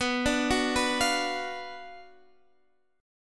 F sharp Major 7th Suspended 4th Sharp 5th, B, chord